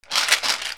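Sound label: percussion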